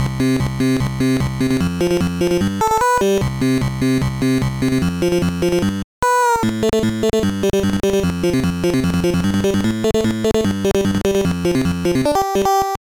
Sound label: Game Boy Bass Waveform